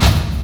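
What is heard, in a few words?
percussion FX, Dance Drums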